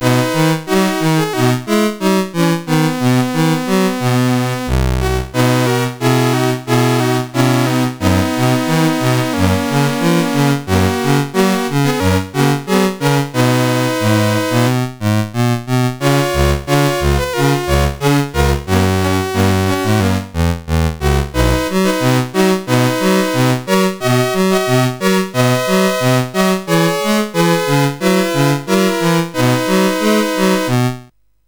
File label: Demo, CSG8580R5; sid